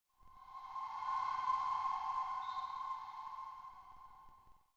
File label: Choir Pad; Ambient; Sid Meier's Civilization 4 Complete; Beyond the Sword